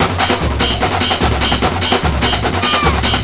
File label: loop; SoundFactory; SMPMANIA